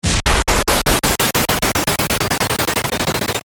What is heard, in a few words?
Sweeper, Chopper; Sweepers (Sound Effects:Sound Design); BlastWave